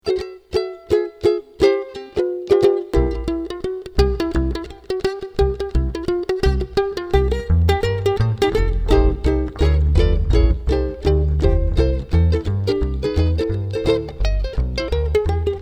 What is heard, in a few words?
Ukulele Blues